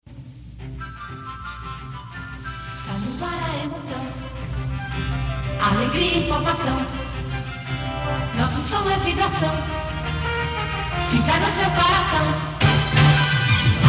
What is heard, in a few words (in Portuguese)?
Radio Atual SP vinheta